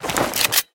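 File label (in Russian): быстрый щелчок перезарядки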